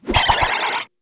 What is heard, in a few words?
Mole attack; Seer; GamigoNA